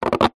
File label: balloon rubber stretched out rub squeak